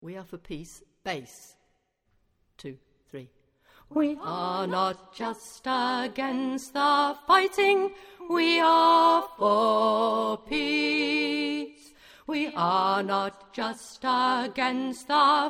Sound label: We Are for Peace bass